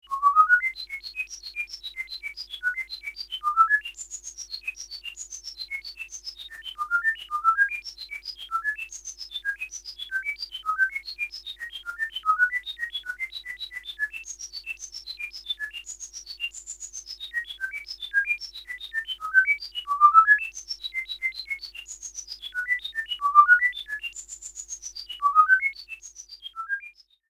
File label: random filter osc